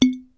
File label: uncork bottle, cooking; 栓を抜く２